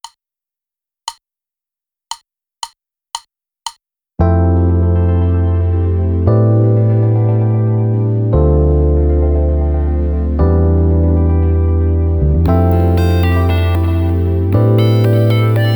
Pocket Pop drumless

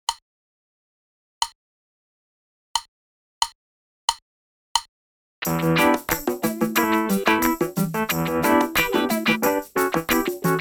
Ooh Wee Funk

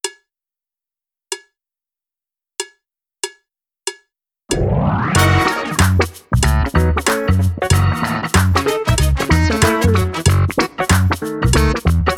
Laid Back Funk drumless

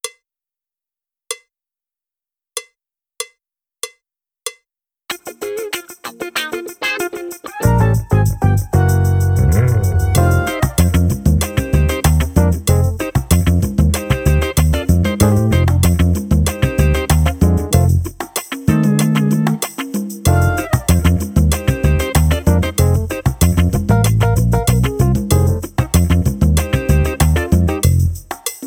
Old School Funk drumless

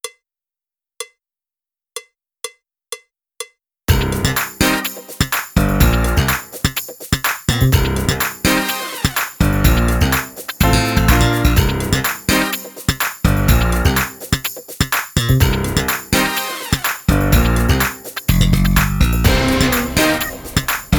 Funky Gospel drumless

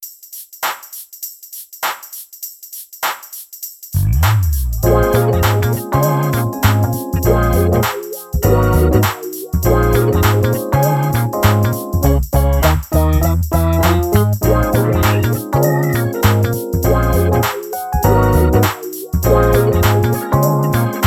Funk No Drums 100bpm; Groove Jammer

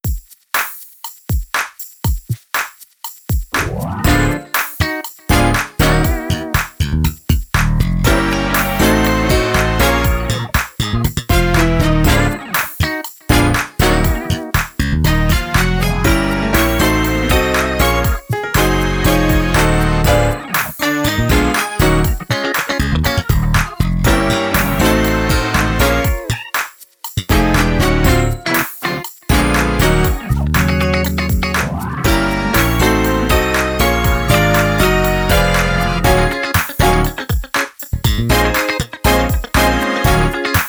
After Church Jam drumless

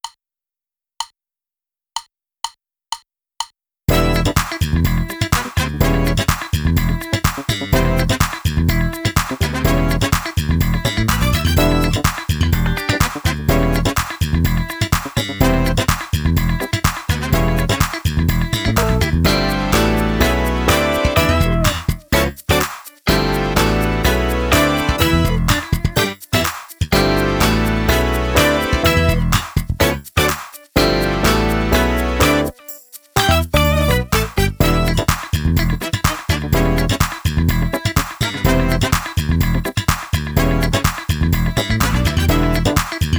Funky Funky Funky drumless

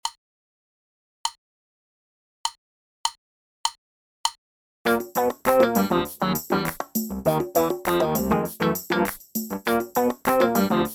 drumless

